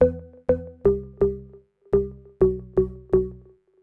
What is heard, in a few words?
Organ chops (125BPM), retro house